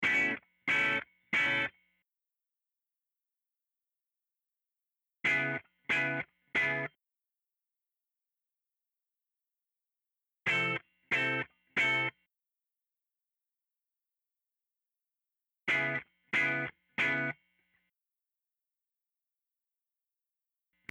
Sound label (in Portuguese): GUITARRA